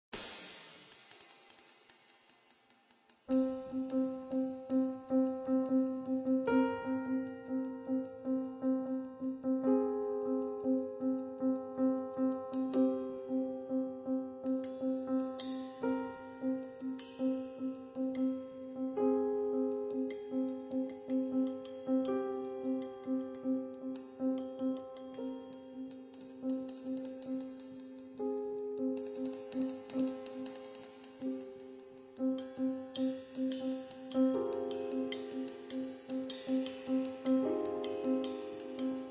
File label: Music Box